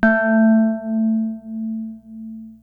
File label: PPG A2, Synthesizer; Hollywood Edge; Giorgio Moroder Rare Synthesizer Collection; PPG.2.3